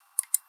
Click; Matias Vidal Saavedra; Animated Explainer Video

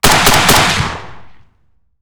HK G3 7.62x51mm off axis Triple Shots; Heckler & Koch; 1m left; MKH418; Pole Position Production

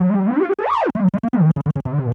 Feed chorused, Feedback FX; 99Sounds; Dark Engine

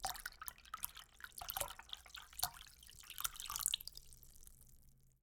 Water Pouring; Sound Spark LLC; Forge Sound Design Toolkit

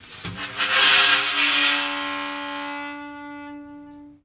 harsh metal; Sound Design Tools; Raw Foley

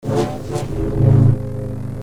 Double Bladed Saber Sound; FXHome

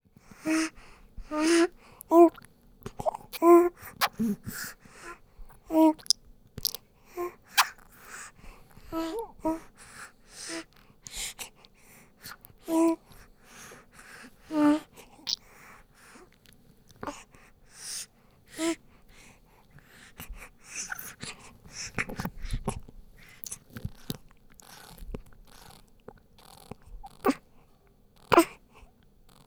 Baby newborn feeding bottle; 2496SoundEffects; Oh Baby! Pack 1 Production Sound Effects

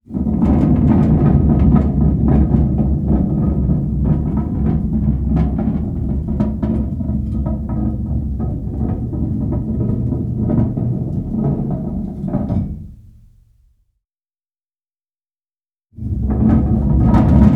METAL - Metal Tube Rolling on Metal Bars; G4F SFX

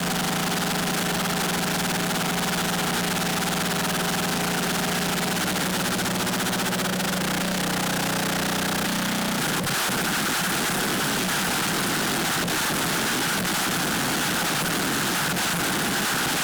Harsh Noise Feedback Loop; Glitchedtones

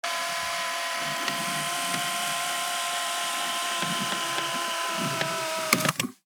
Car Adjustments Seatbelt, Mercedes E53 AMG; SoundBits

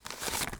Money, Bills, Hand, Count, Single, relaxed; Hzandbits

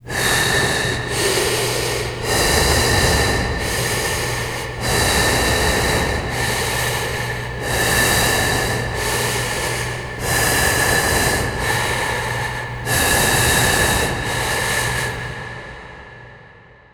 Deep Breather; 2496sfx; TheHorror

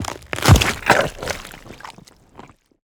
creature texture mud, Cave Creature; Cave Creature Sound Effects; Bluezone

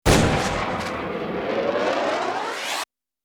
Bullet Time; FXHome; Bullet and Gun

